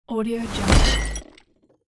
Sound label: transition sweep debris impact; transitions and movement; خرد شدن شیشه